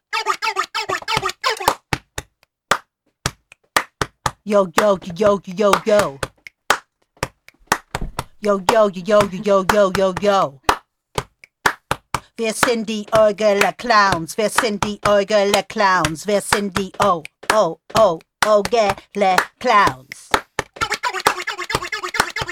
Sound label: Beat